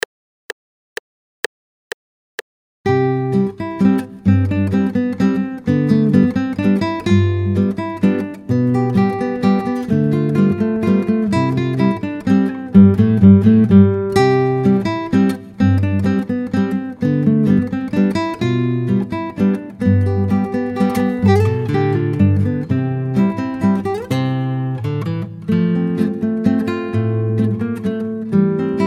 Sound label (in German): Landler Walzer